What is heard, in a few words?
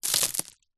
foley dirt very small stones debris handful drop ground; zapsplat